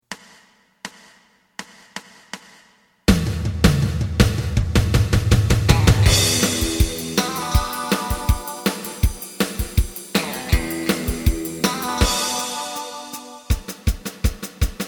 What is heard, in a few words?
bass